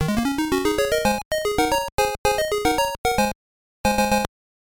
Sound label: Jingle; Taisen Mahjong Hao Pai 2; Game Gear